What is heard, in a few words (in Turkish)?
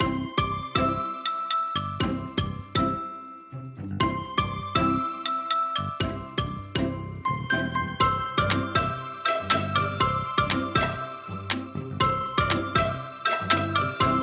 vestel zil sesi